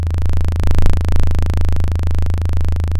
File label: pop, chainsaw